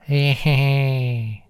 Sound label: ehehee